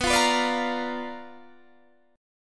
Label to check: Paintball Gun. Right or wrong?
Wrong